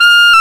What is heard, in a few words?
WND HARD, Clarinets; Clar Bryt